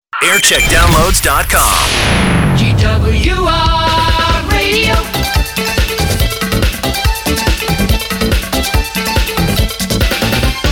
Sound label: jingle montage; gwr